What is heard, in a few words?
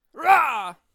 Bomb on a Stick Attack Vocals